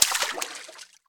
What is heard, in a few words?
Water Run